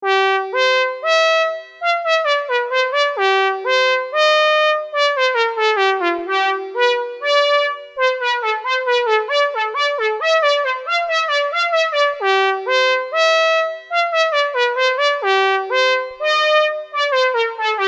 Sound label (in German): Oszillator Chorus; Boss CE-1; ARP